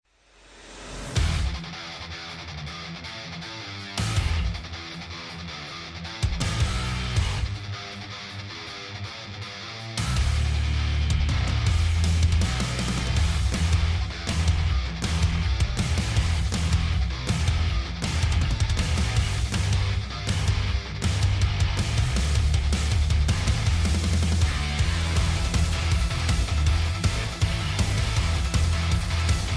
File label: Battle Forest